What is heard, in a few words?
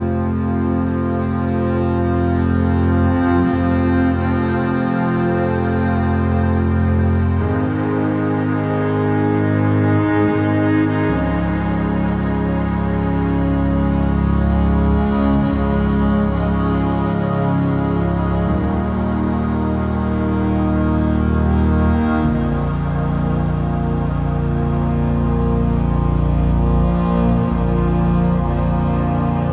Solemn Strings - Fmin 130 bpm; MIDI Clips; Tonal; Motifs; Ableton; Mood Reel